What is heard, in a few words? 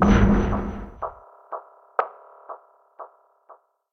Pietimer